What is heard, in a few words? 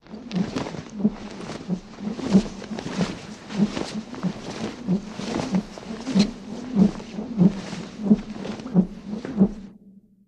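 Pterodactyl Flying, dinosaurs; animals wild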